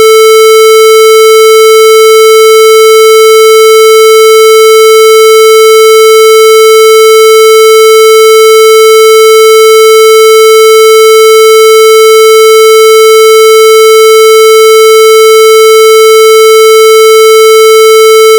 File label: A 440Hz clipping